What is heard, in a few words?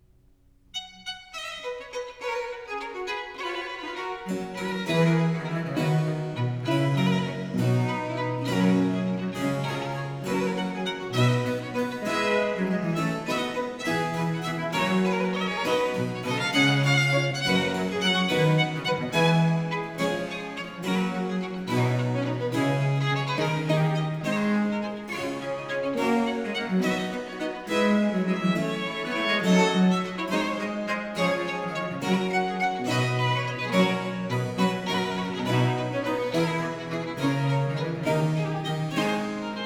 Ayres for the Violin, Sonata in C Major, Fuga - Presto; Book 4; Italian Baroque Violin Sonatas; Nicola Matteis; Ayres for the Violin, Vol.1; 尼古拉斯 · 麦克吉根